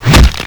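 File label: wrench big hit body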